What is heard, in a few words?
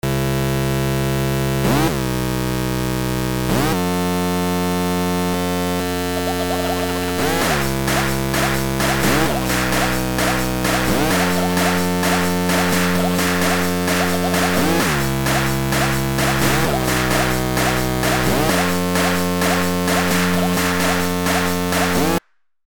serum2 new preset